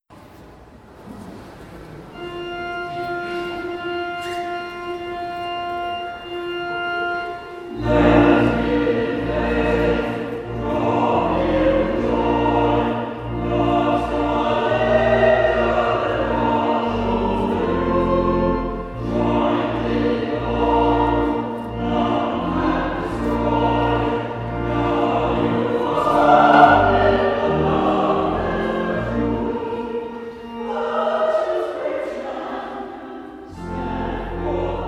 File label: Bridal March Choral; Lohengrin